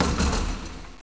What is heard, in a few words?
Hit Rim; Intel; Createshare; PC Camera Games; Shoop